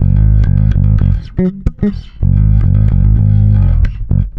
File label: NASTY G#, bass; Spectrasonics; Bass Legends